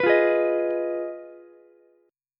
B 6th Flat 5th B down Ukulele; strummed

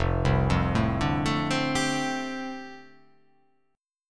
F 6th Add 9th F down, chord

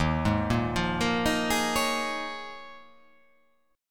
D sharp 7th Suspended 2nd Suspended 4th

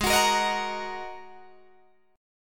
Ab 7th Suspended 2nd down Guitar DADGAD

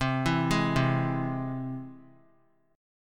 C Augmented down; arpeggiated; JGuitar

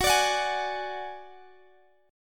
D-Major Flat 5th, F sharp

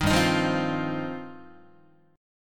G 7th Suspended 4th Sharp 5th, Guitar

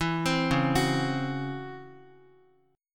C sharp Minor Major 11th C sharp Guitar; C#mM11 arpeggiated; JGuitar

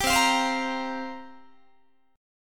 C 7th Suspended 4th, Guitar, chord; C7sus4; strummed; 22,20,20,22,21,21; 4A,4E,5A,5D,5G#,6C#

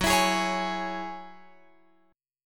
F sharp 7th Suspended 2nd, Guitar Open F; strummed; F#7sus2; x,13,16,15,16,15

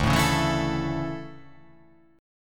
D Major 7th Suspended 2nd

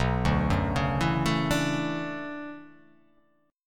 B Minor 11th B down

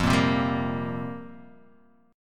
F Suspended 2nd Suspended 4th F down

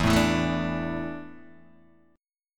E 7th Suspended 4th, Guitar, DADGAD

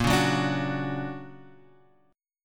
D Augmented Major 7th Bb, guitar

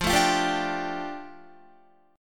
F 6th Add 9th F down Guitar, chord

